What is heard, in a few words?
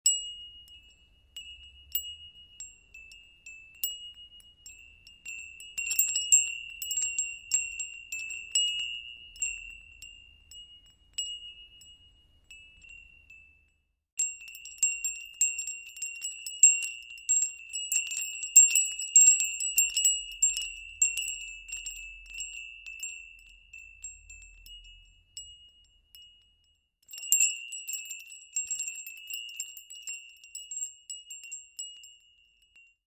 Bells Tubular, musical